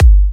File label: G, kicks; markus hakala kicks